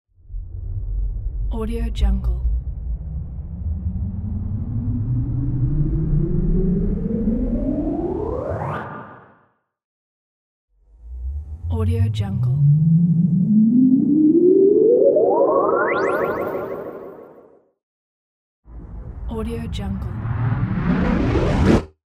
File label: transition uplifter